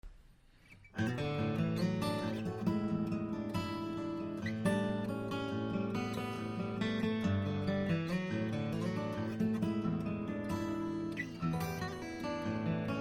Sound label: Collings D1 A; Carl Miner